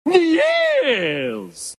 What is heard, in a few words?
CORRECT